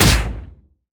enemy minotaur charge stop

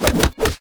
princess melee

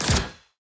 basic Attack cast; heroes onagro

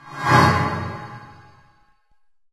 flag glow

